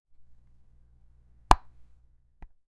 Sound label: fist hit person face; human interactions